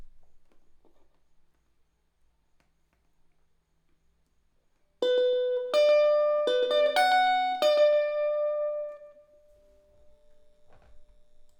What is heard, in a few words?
Elect Guitar